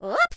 Cat launch grunt catch fall

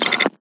Button long; Voices of the Void

